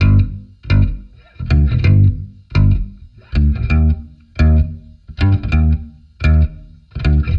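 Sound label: G Bass Picked Staccato, 130; Zero-G; Future Wave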